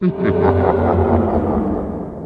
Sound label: Undead